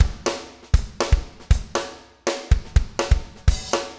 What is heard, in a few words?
4 on floor 120, loops, 120bpm